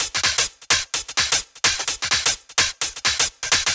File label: Minimal Loops